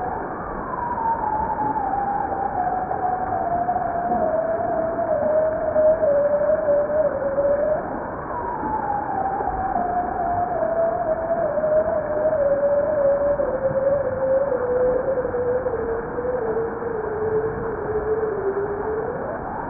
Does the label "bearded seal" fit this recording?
Yes